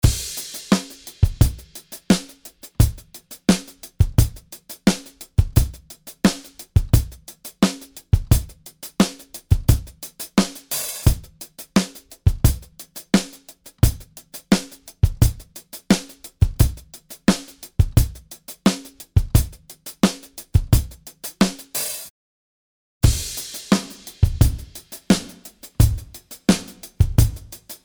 Room Studio

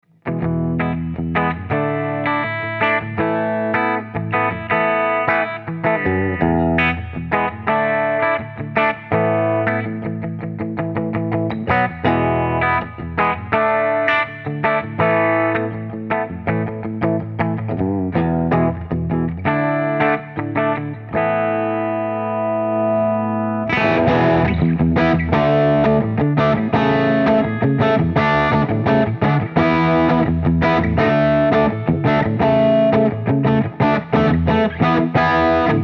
Fender Acoustasonic Jazzmaster

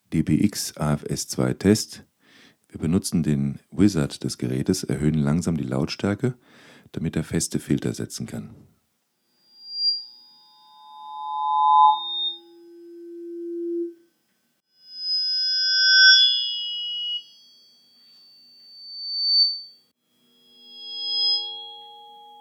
DBX AFS2 Test

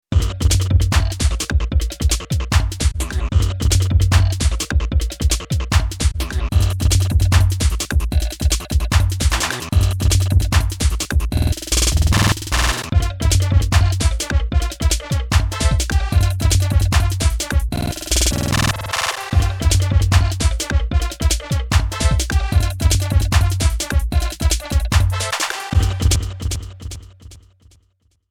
Casio XW PD1 Footwork

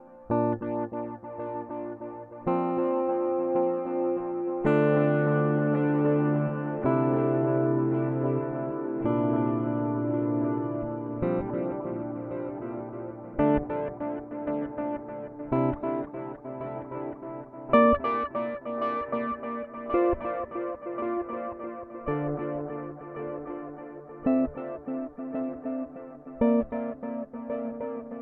Timeline Stereo Dual; Strymon

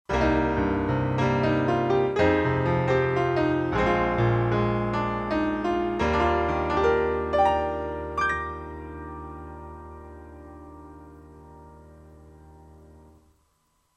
UA 476p boesendorf 290 close comp fast

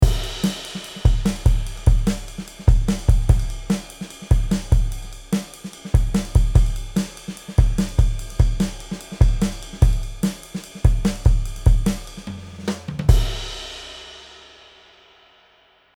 Chandler TG 1 Drums dry

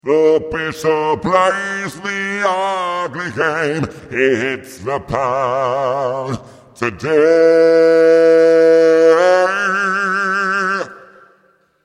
Bari White

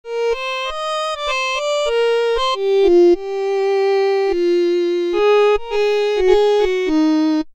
Slow bow

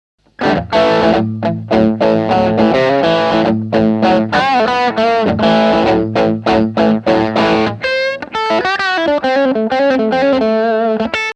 Bridge PU Tube Screamer

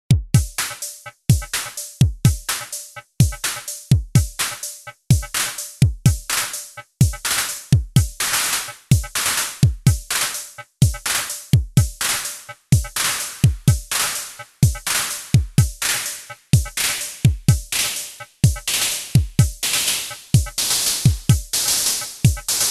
Korg Volca Drum Clap

